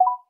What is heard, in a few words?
Volume Up, ui; Meitu V4s